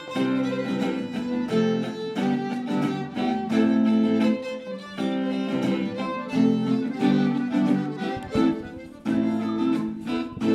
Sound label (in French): accompagnement